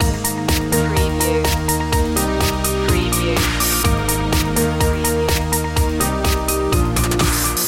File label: dance train loop